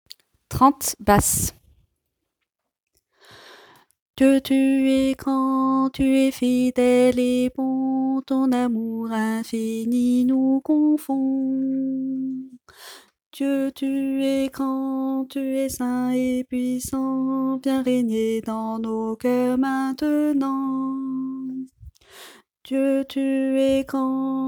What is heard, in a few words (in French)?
basse